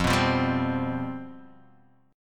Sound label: G 11th F